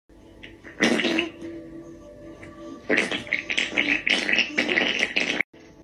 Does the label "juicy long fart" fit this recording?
Yes